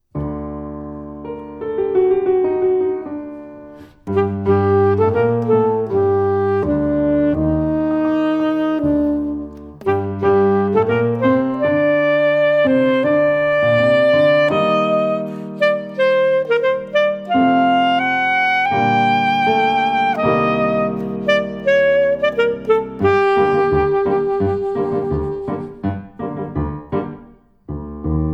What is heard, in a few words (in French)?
Sax Mystere d Orient; Escapade musicale saxophone